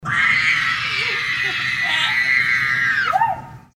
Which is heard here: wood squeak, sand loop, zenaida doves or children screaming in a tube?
children screaming in a tube